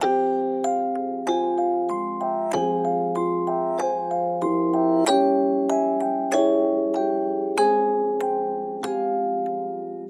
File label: Melody Loop BPM 95 C Maj; Gully Loops